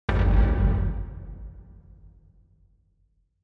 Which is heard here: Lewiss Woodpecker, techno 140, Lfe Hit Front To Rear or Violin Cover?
Lfe Hit Front To Rear